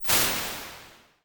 Horn Section Plate, impulse responses, plate reverbs; Medium Spaces; Apple